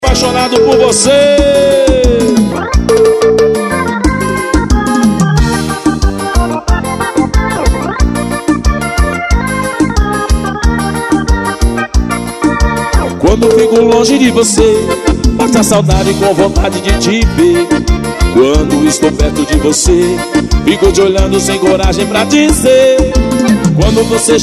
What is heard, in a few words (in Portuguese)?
promocional ao vivo na festa; festa de sao marcelino champagnat